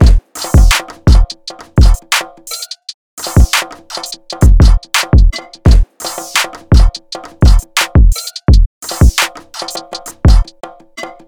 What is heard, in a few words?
Drum Loop; Cymatics; All Night